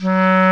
Clarinet & Bass Clarinet

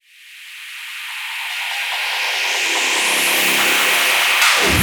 Sweeps Up, Effects

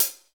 CYM 14, Real HiHats, 14 Hi Hat

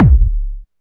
BD SYN6, Korg Electribe EMX-1

